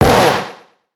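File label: Ogre attack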